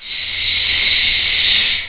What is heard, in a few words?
roach hiss, cockroach